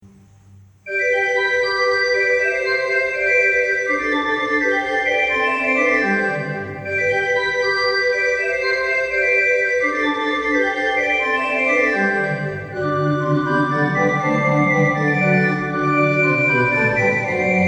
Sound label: sonata in g allegro